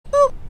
boop; garrysmod

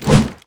swing; garrysmod

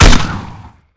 new nade launcher; garrysmod

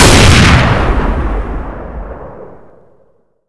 new awp; garrysmod

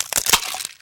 egg crack; garrysmod

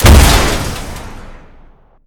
panzer; garrysmod

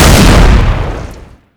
explos; garrysmod

